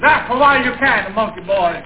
laugh monkey boy

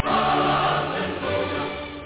hallelujah

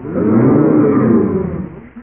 ohhh

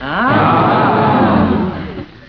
ahhh

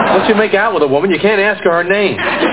makeout

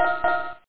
beep kind warning

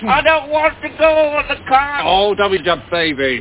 cart

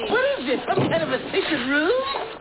secret room

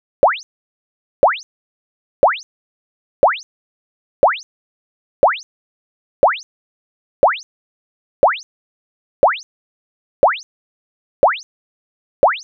gpr sweep

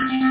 beep robotic

